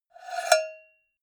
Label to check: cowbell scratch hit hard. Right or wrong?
Right